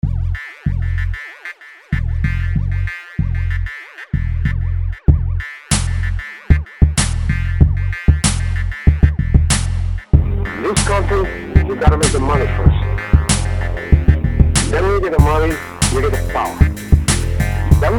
instrumentals

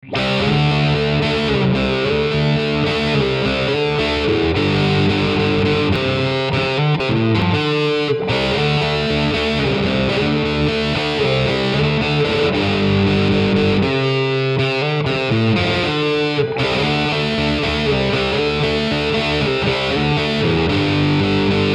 hum drive

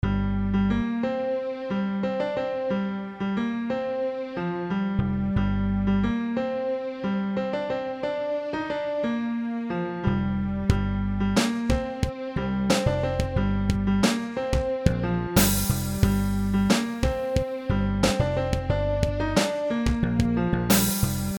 loop

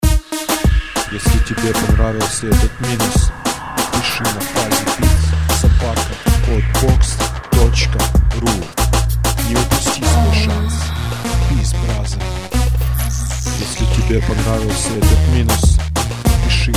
reggaeton

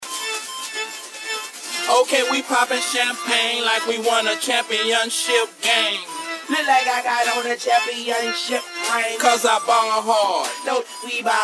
acapella

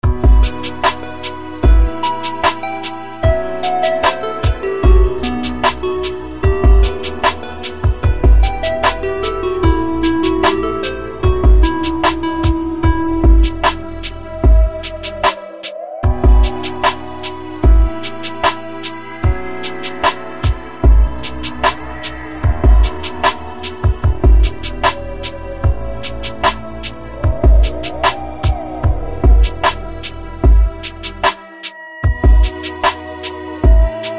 instrumental lirika

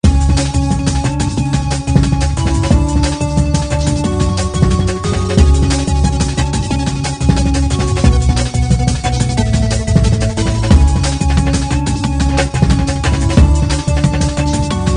drum

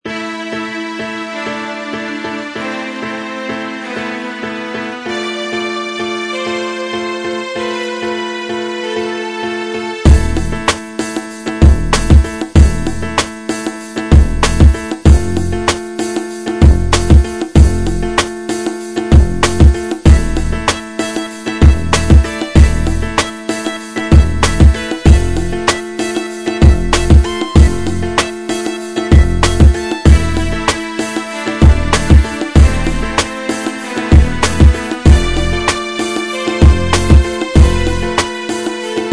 piano

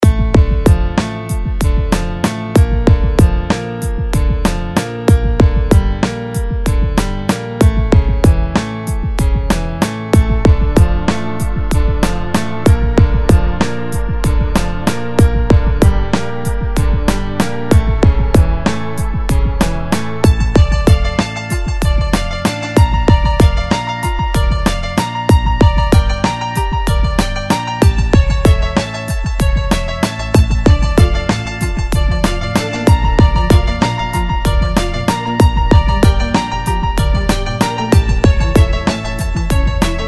instrumental

